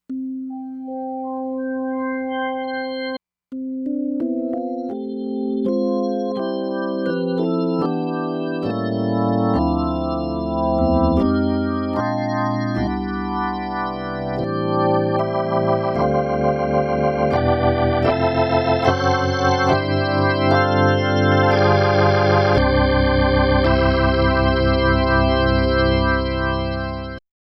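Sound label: Org XS Master 8 Dbars Rot 2 push move, organ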